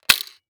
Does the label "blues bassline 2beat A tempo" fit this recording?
No